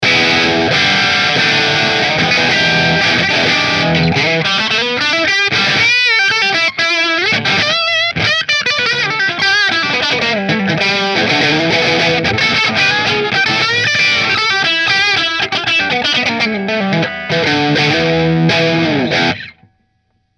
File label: Goldtop Bridge Marshall; Tokai